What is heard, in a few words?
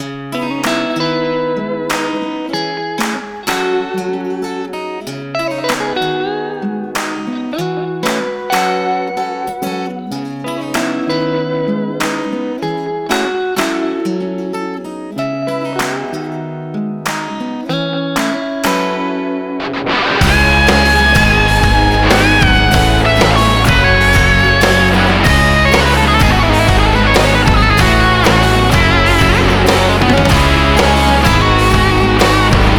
Emotional Romantic Rock Tune